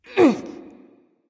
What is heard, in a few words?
grunt lift; smite; aphrodite